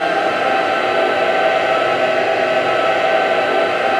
AVANTE G, Voices

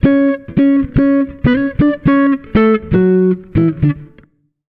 FK SOLO, Jazzistic; FNK

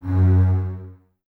Basses F#2, String Ensembles; Miroslav Vitous; LINKS ORCH

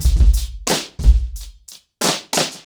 90BPM, acoustic drums; The Roots Drumloops

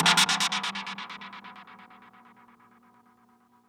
Gated Cymbal; musicradar

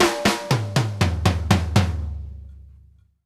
ALL AROUND, SP FILLS 120, Drumworks; Sampleheads - New York City Drumworks VOL-1

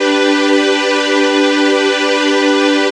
B, Pads; Zero-G; Total Drum Bass

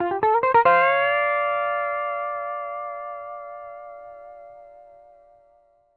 PD LOOPS 140, Whole Lotta Country

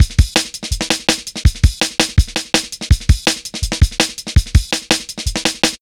165, Drum Loops, Drum & Bass; Total Drum&Bass

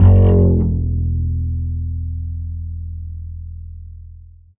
Upright Acoustic Bass; 1ST POSITION; Larry Seyer

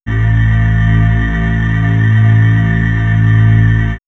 Swirls, pads; Ganymede; X-Static Goldmine

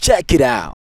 VOX VOX; Sound & Vision - Gigapack II CD 2

